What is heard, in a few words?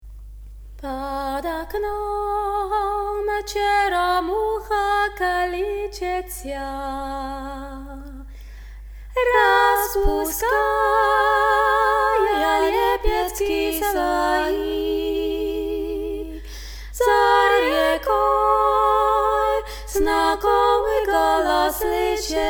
tutti